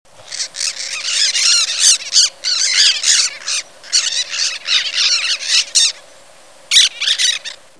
olive throated parakeet

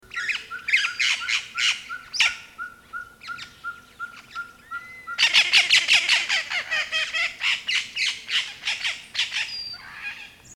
yellow lored parrot

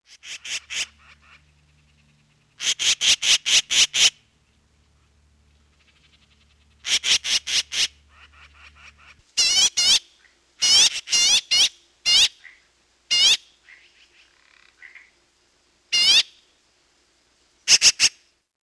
yellow billed magpie

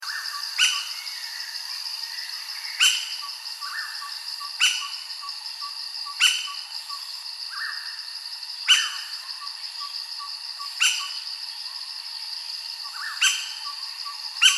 laced woodpecker

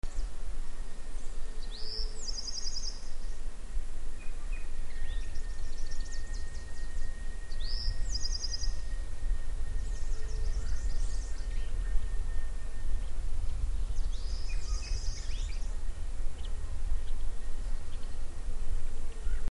oaxaca sparrow

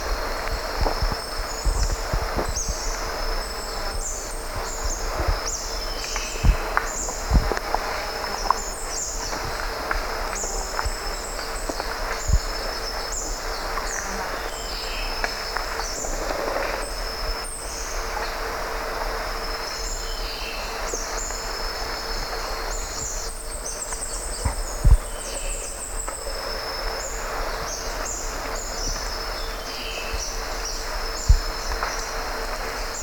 wallaces hanging parrot

